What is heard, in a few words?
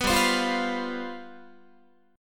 G 11th, Guitar; strummed